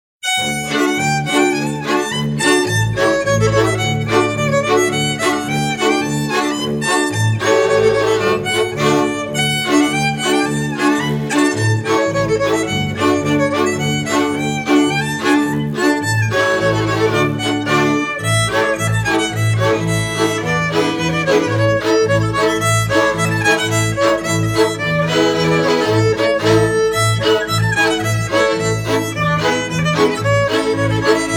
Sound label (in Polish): Polka z werwą